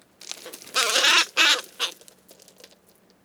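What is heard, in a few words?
hooded crow corvus cornix cornix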